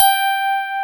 DIGIRHDS G5

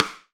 RIM MIX, AMBIENCE RIM

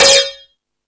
Crash 2, drum machine

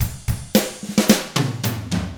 POP ROCK, Drums; ILIO; Double Platinum Drums 1

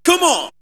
VOX SHORTS; Megabass Remix! 2

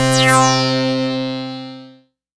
lead C3, Moog Minimoog; Leads and Keys

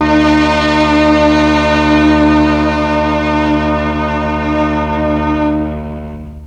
MEL STRING; ILIO; Propeller Island Legendary M400

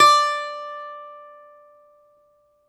BALALAIKA, Russian Strings

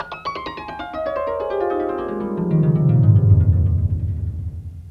F3 HARP; Greytsounds; HARP+STEAMER

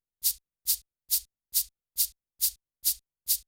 SHAKER, Techno 138 BPM; Best Service ProSamples vol.54; AKAI; CHICAGO 5 O

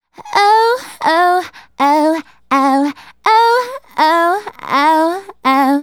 Female Solo, Vocal; Sonic Foundry (Sony Creative Software); Vocal Toolbox

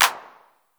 909 CLAP, TR-909; Hollywood Edge; The Big Bad Beatmachine

